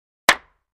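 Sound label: 2x4 Hammer Nail On Cement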